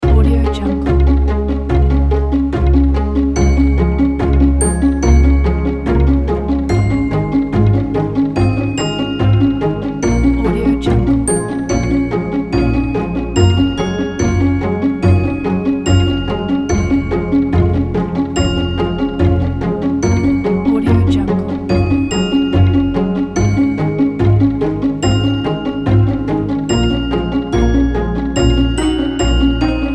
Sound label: a happy music